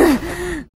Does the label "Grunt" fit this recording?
Yes